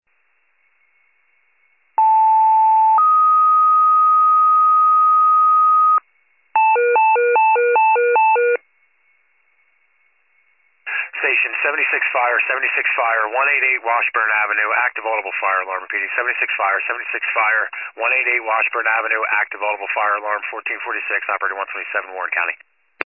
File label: FIRE pager alert